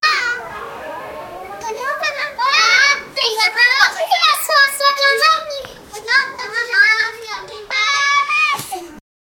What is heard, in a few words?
kids smiling